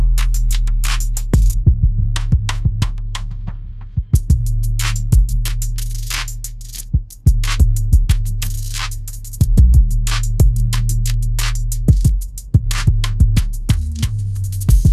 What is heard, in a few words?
trap beat; ai